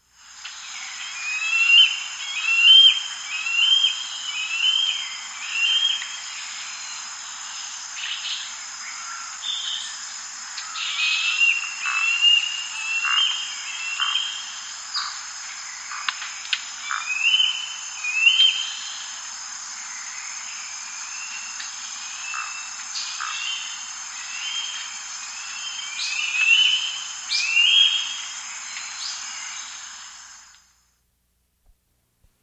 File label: Semiplumbeus Kite; S. America